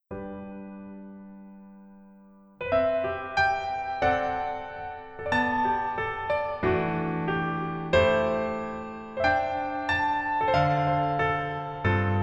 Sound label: Piano ver; 더킹, 영원의 군주